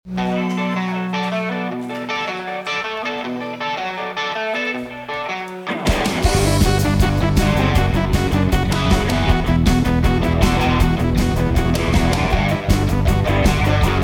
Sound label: rebel yell